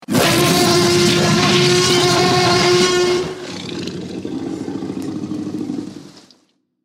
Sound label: trex roar